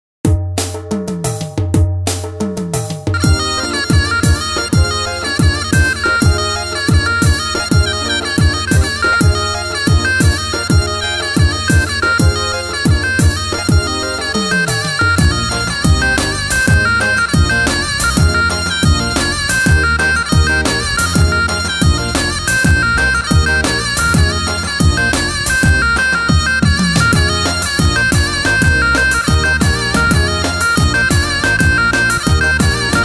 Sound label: Sivas Dik Halay